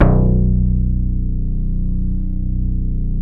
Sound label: BAS B1, 2 Step; USB Soundscan vol.51; House Side Of 2 Step; AKAI; BASS